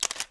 Shutter; LG KU3800 Bubble Pop; cam

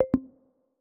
Lock

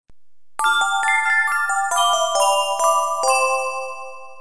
Positive ack; LG U8500

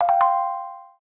Alert New Reminders; HTC T-Mobile Shadow

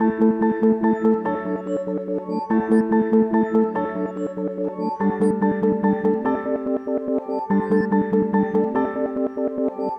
Incoming Call, Ringtones; Rakuten Mobile; Rakuten Link sounds

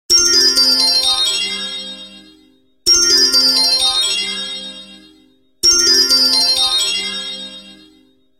Arpeggio, Sony Ericsson K850i ringtones

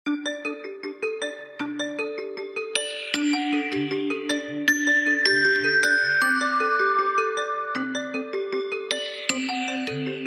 Dewdrop Step; Samsung SGH-i997 Infuse 4G; ringtones

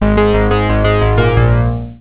Harp Synth, Cisco Unified IP Phone 7945G

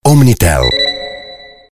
Power On Off; OmniTel

